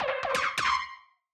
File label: Alien Phone, Melodic; SFX